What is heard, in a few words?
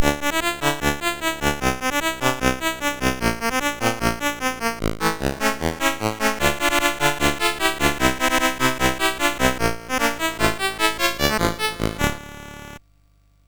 Rocket Ball, MOS6581R4; GAMES